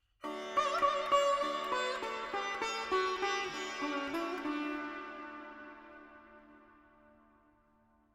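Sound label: Veena, melody, 7-8, D, 100 bpm, FX; Sample Banks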